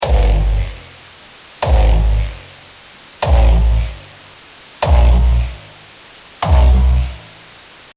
RAW kicks

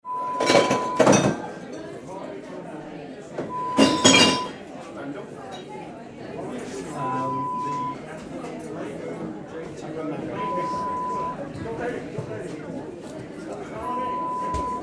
Bar sound

